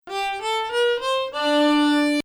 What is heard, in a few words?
Bayati Rast, Maqam Bayati; Rast 4